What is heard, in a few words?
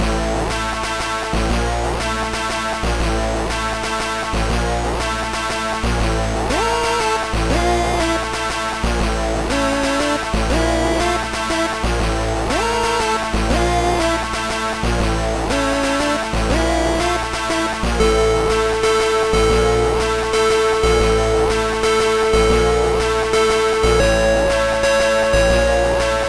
metal; MusicLine